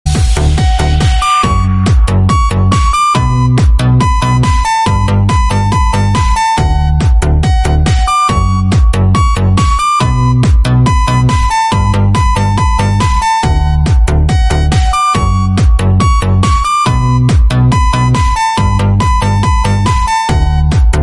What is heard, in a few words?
dj remix hot ringtone